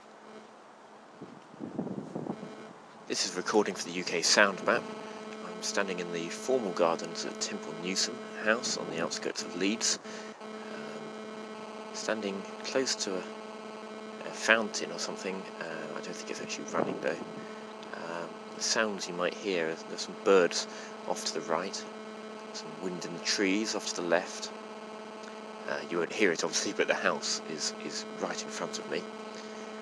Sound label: formal gardens at temple newsom; uksm